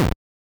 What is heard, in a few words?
miss